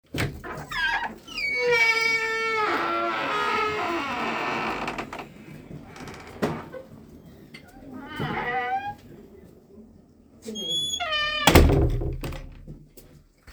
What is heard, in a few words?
Big Medieval Door Open And Close